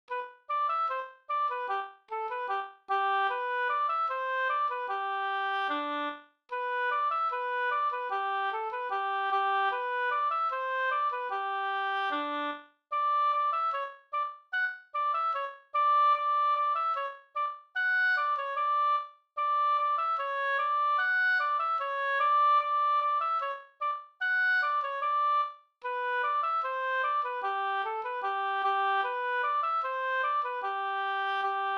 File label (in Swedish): halling